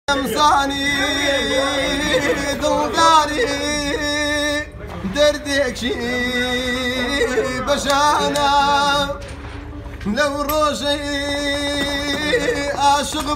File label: kordi ba gitar; kordi ghmgin